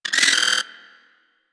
Hyena Vocal Pain; Vocals; Warframe; Hyena Micro

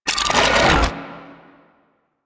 Scales Deactivate; Warframe; Dragon

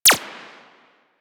Archwing Mach Gun; Weapons; Warframe

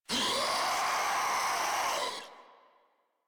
Proto Infested Ancient Heavy Bark, Enemies Vocals; Warframe

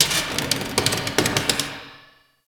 Metal Creak Small; Ambience; Interior; Warframe; Orokin Derelict

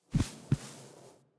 Puppy Foley Stand Idle To Sit Idle; Warframe; Kubrow

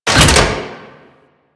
Infested Metal Clunk; Ambience; Props; Warframe; Corpus Infested

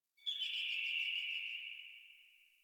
Tree Distant Critters Happy Calm; Ambience; Warframe; Duviri

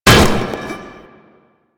Umbrella Stand Destroy; Warframe; NineteenNinetyNine

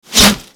Bullet Default Hit

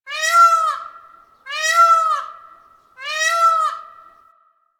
Forest Bird Cries; Warframe; Ambience; Grineer Forest

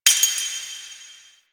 Metal Debris; Warframe; Quests; TheNewWar; Ambience; Zariman

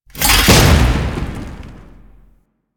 Heavy Block Ability End Stomp; Warframe; Duviri; Dax

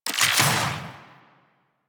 Infuse Blast UI; Warframe; Warframes; Alchemist

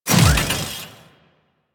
Mech Melee Swing Start; Nechro

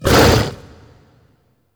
Axe Weapon Swing; Warframe; Tenno; Wolf Frame

